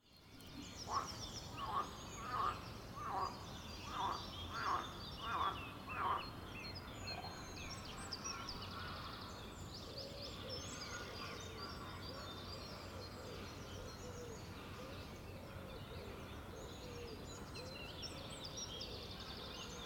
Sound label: ambience